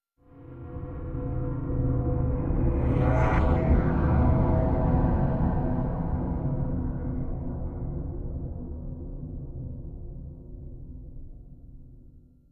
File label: Stinger Swell Long